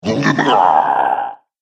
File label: Alien Ogre; Alien Sound Effects